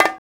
PRC, Bongos

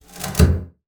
BASS FINGER; Xsample VOL-5

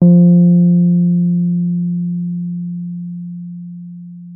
Wurlitzer F3; Xsample VOL-1; 90 s Sample CDs; WURLITZER B

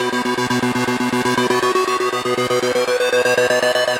Kaossilator processed (120BPM); Korg Kaossilator; creative gate; musicradar

